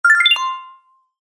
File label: desktop notification; kapchat